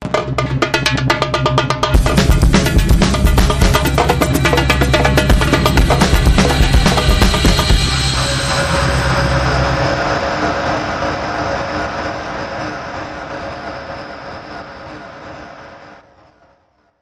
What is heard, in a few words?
Cuica Sting